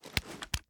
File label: reload empty hands; gmod